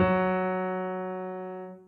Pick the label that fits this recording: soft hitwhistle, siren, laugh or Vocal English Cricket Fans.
soft hitwhistle